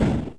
flame end, flame thrower; weapons; Kingpin

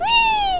snail, whee; pcwhisk

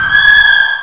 wiiieeeeee; The Epic Collection 3; Epic Marketing; muzak samples

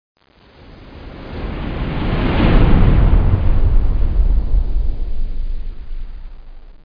spacship

